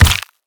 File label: player damage body